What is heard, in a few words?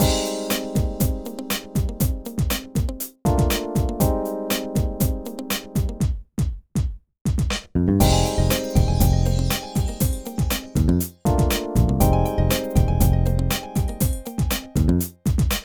Hip-Hop Stage (Karaoke); pinky street kira kira music hour pop princess gamerip